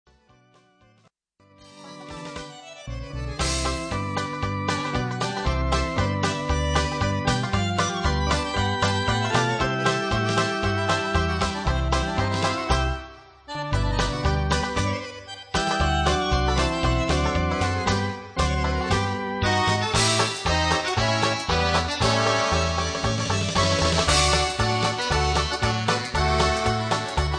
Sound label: Iron Range Polka